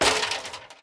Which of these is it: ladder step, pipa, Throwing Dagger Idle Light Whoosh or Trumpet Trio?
ladder step